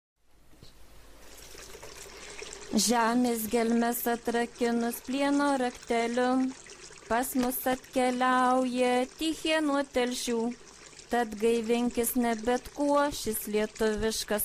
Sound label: anthem vocal; Septmountania